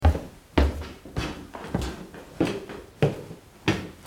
Footsteps on stairs

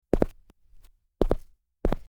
footsteps on concrete

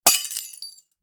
Glass breaking

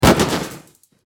car crash

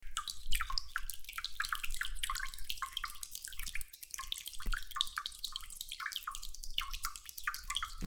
dripping pipe

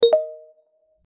Text message sent

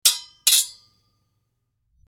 Sword clash

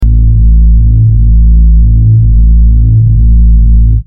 ambient hum

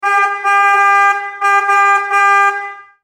Car horn honk

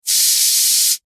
Air hiss; Sound FX

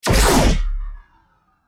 plasma blast; Sound FX

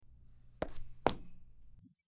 footsteps sneaking

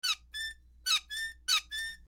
Squeak

